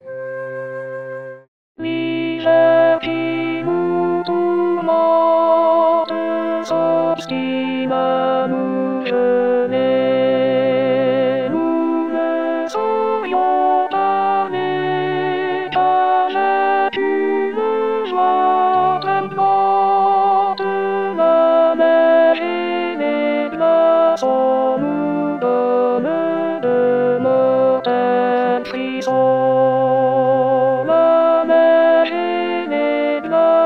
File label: alto